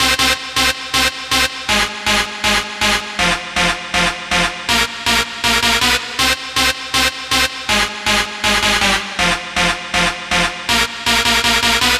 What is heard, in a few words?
Dance Synths, Hardcore Loops, Synth Loops